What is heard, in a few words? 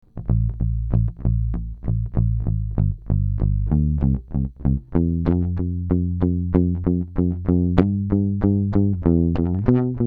Palm Mute Med Tona Mid